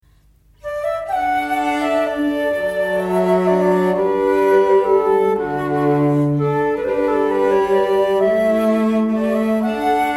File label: Irish air; si bheag si mhor; turloch ocarolan